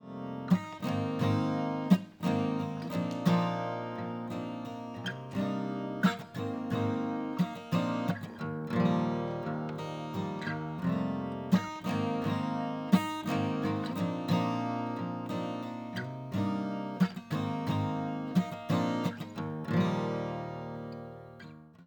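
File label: Acoustic guitar, R-101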